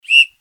Coach Training Whistle

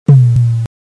Pop

